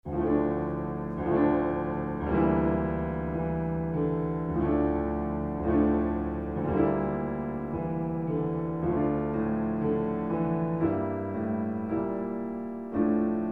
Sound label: for double bass; Sakura arr. S. Suzuki